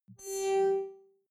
beacon flash; signale

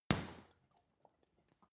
boxing punching bag hit medium; boxen

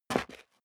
step sneaker concrete grit single stair down; schritte

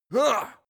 male fight soft; menschen; schreie

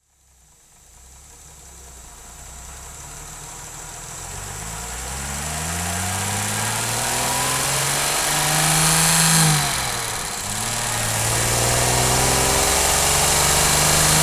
car jaguar e-type accelerate long engine

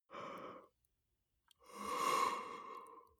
male yawn quietly; koerpergeraeusche; menschen; soundpool

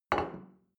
plate place table soft short, gastronomie; soundpool

